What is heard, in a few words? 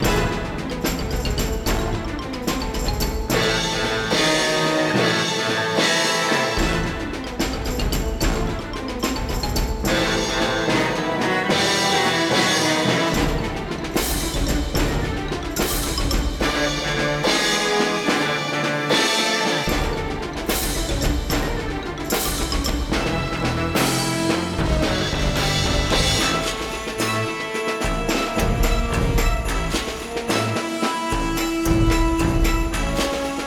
Battle LOOP, soundtracks